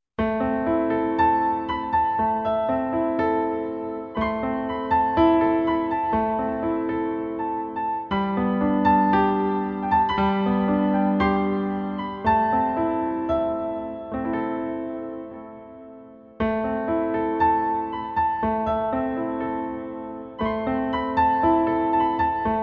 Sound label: piano play